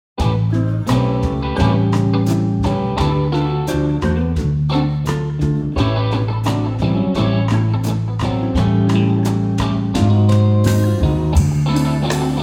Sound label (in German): gesamter BackGround ohne SoloGit